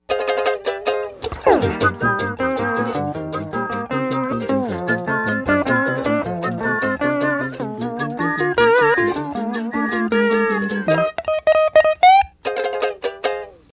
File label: Ukelele; SpongeBob's Next Big Adventures Online